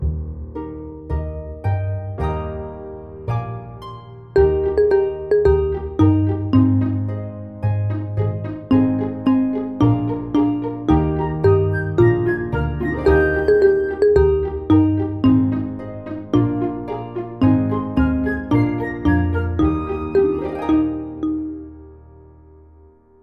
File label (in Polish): plas bez wokalu; szesciolatki; multiprzewodnik; akomp